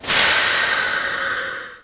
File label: pod airbrakes on